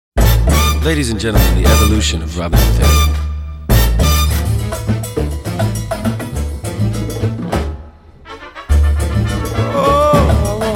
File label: ringtone; step up 2 the streets